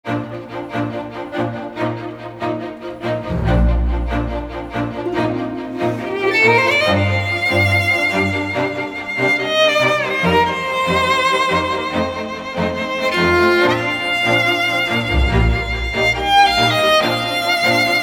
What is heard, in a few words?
Italian Violin